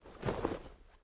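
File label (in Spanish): SOTANA, cassock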